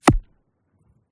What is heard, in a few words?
rocks impact hit single stone; PMSFX